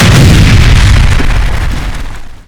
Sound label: explosion suitcase bomb